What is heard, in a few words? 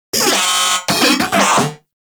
Moa Vocal Enemy Spotted; Warframe